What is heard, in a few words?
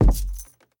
Footsteps Wood Walk; Devour; The Town